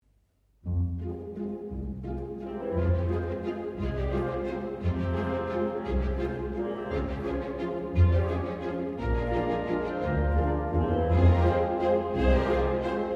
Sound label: WALTZ